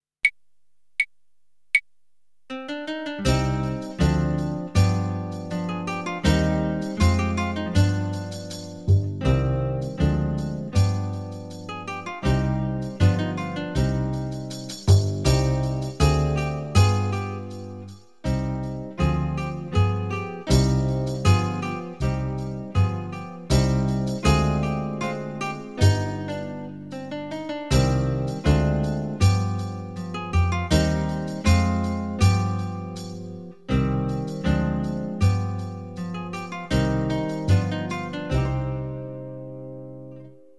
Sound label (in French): Le Tambourin